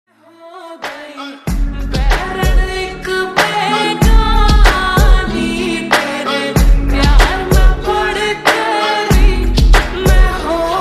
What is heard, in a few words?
Slowed Reverb Ringtone